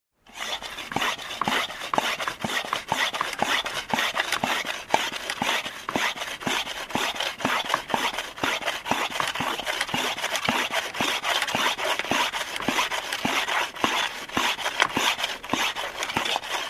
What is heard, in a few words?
Hand Saw Cutting sound like